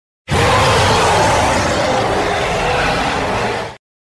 golem roar

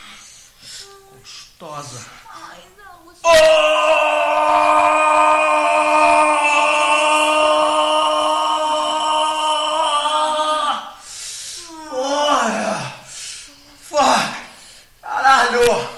sirene

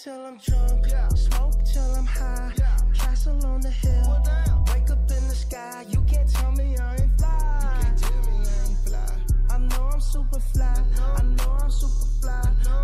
follow sound

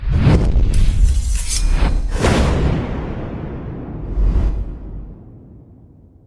bass slide

